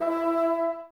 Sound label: BNS E 3, Bassoons, Wood Winds; Miroslav Vitous - Woodwind Brass Ensemble; Bassoons Links